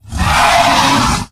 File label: snake alert; dn3d r; enforcer